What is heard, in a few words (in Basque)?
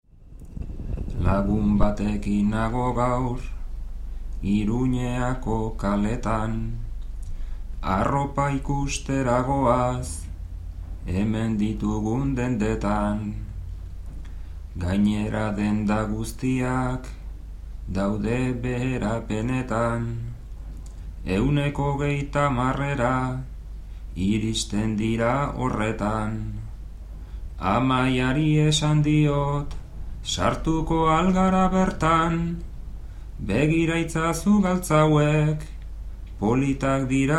bertsos